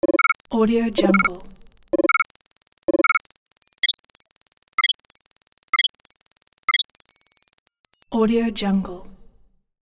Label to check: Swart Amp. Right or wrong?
Wrong